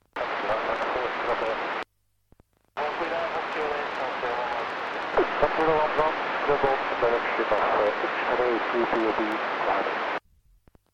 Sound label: lifeliner 4 scramble; to hoogkerk and midwolda